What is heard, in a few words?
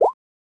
drop